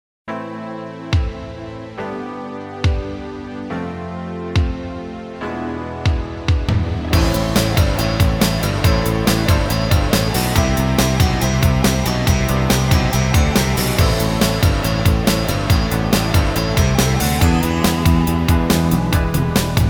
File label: escape from darkness - instrumental; City Hunter Special '99 original soundtrack